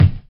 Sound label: original kick f sharp key, Loudest frequency: 180Hz; kick drum samples